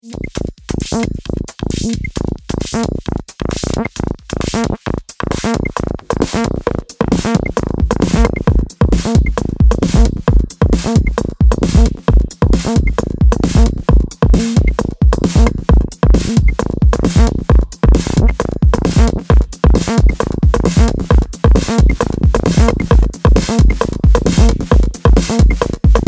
woodblock pop

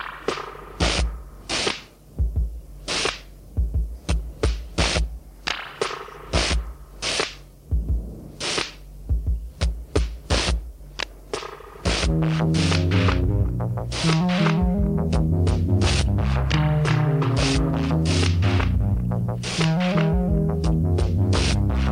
drum avant

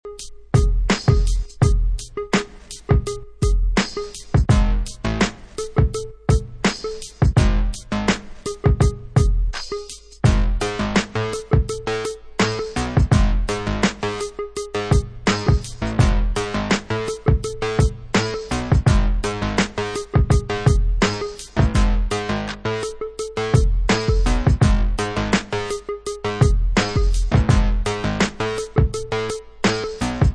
Instrumental

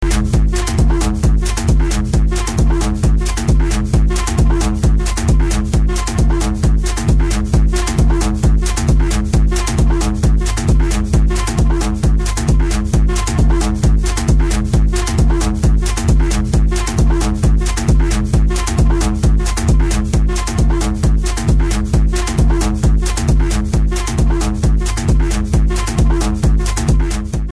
Quartz (locked groove)

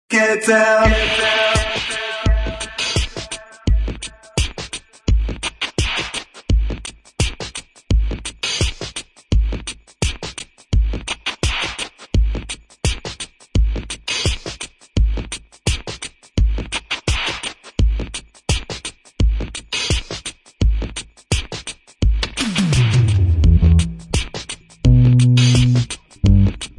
beats and bass

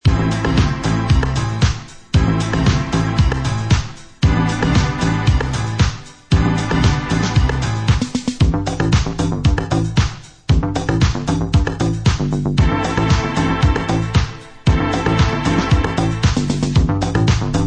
Instrumental

